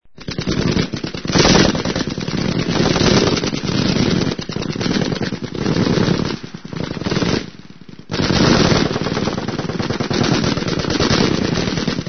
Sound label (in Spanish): Tiroteo fuego cruzado